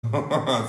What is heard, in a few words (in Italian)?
risata